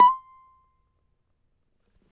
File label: CAVAQUINHO SLIDE, Guitars